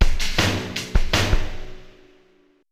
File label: drums